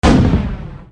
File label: fire one, modern weapons; golgotha